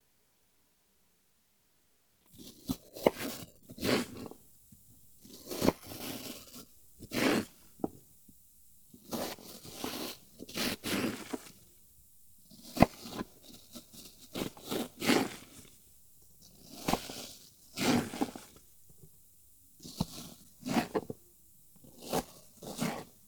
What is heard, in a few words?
Chopping Apple Slow